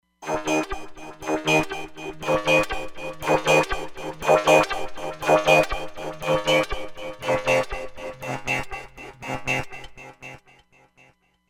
Vocopanner, Roland Fantom XR

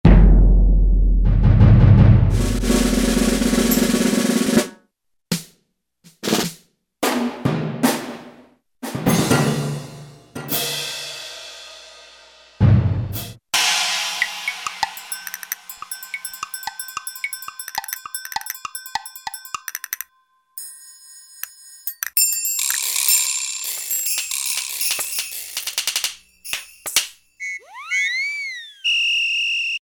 Orchestra Kit, Strings And Orchestral Percussion; Roland L-CDX-04 Strings And Orchestral Percussion

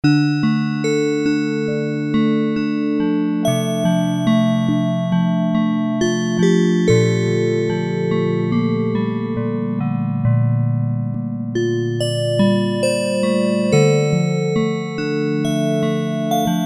BELLS, Korg DW-8000; Factory Patches

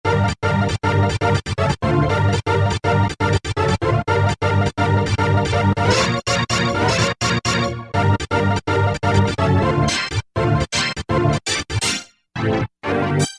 hit-StringIt; E-mu Orbit V2; Factory presets

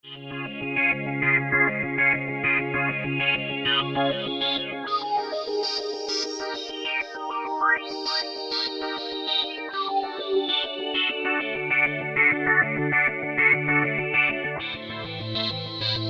DWGS Wave Seq, Korg MS2000; Factory Patches

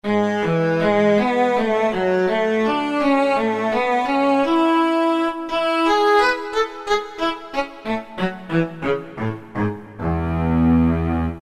Cello; Roland PN-D50-01

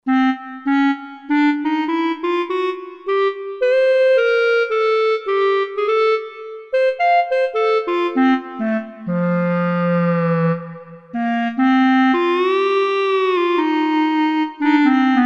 Clarinet mp, Roland JV-2080

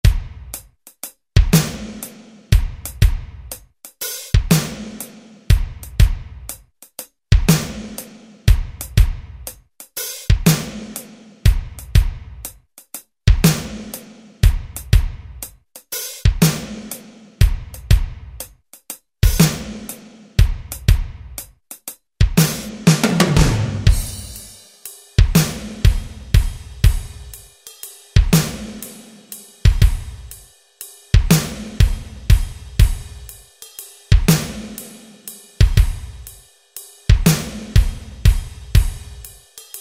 Ballad, Alesis SR-16; Preset Patterns

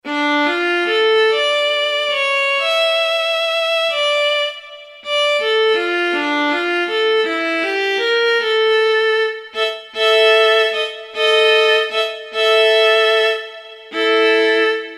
Solo Viola; Kurzweil K2000 Orchestral ROM

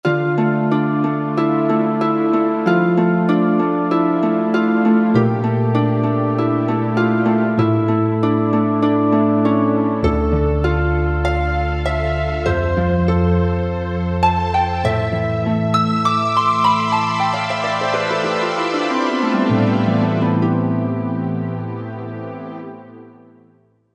Harp & Strings, Korg Wavestation A-D

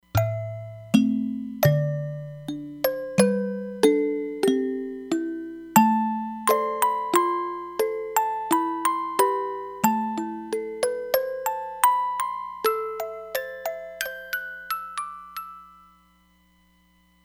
Musicbox

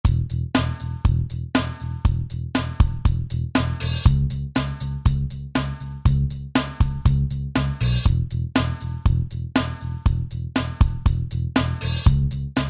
Rock

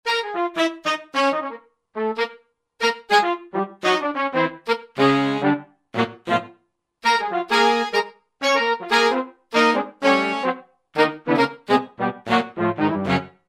Soft Saxes; Roland SR-JV80-09 Session

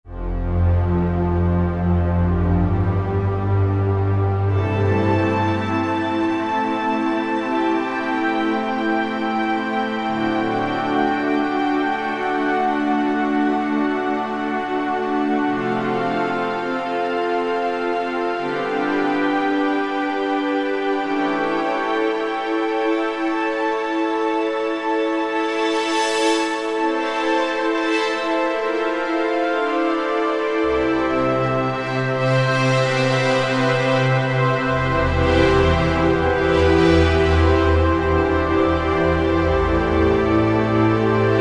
PWM; Roland SRX-05 Supreme Dance

